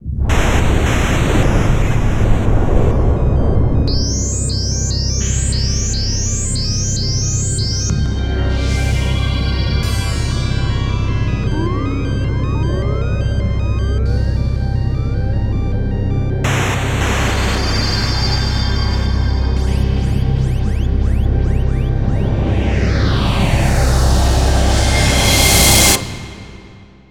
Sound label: Shooting Boss; Bayonetta; ps3 gamerip 2009